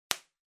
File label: Bubble Wrap (Pop Close); 気泡緩衝材; プチッ 近い; 梱包材